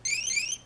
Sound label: nokia 3210 cladoceran ringtone